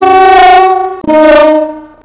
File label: horn